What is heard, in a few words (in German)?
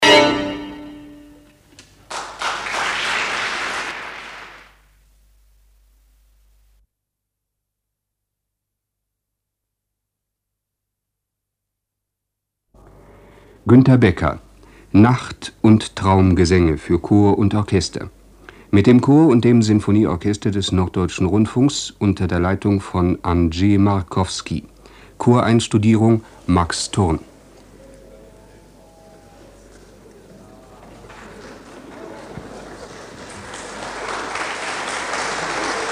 streichquartett